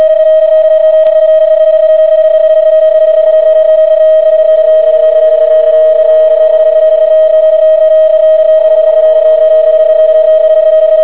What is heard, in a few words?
psk31